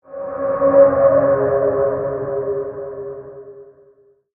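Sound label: Creature Sea Monster Distant Call; the unfinished swan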